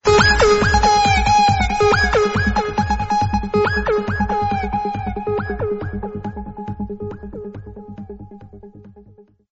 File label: loud sms